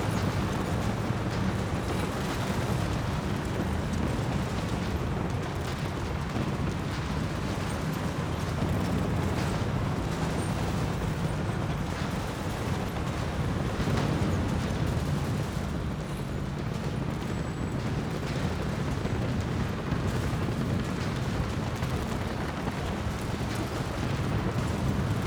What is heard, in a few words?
town in battle; WAR